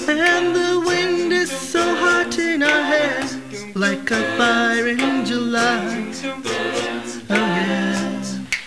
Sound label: fire